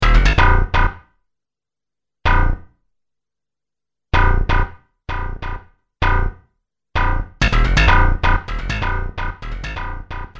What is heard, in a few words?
d-50 slap; Slap bass